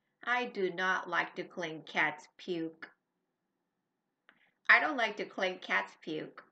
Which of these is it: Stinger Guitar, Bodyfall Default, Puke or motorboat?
Puke